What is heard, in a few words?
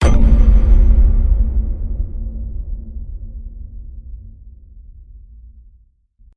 Hit Sub; Ninja Tracks